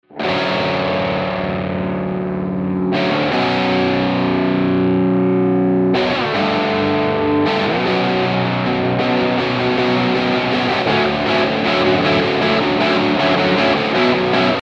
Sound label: DRIVE, Guitar Rig; GR5 Presets